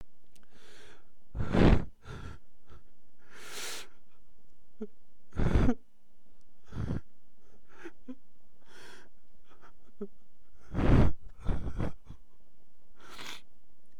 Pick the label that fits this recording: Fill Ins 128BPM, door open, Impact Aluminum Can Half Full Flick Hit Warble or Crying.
Crying